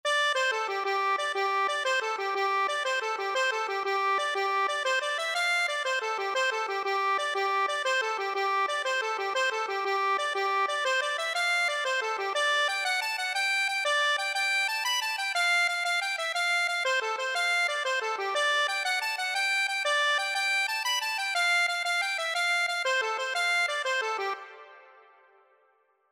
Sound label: accordion